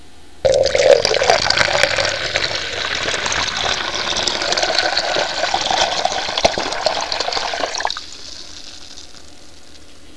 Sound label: POURING, WATER